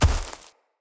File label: Step Veg, Footsteps; Enemy; Corrupted Sproutfolk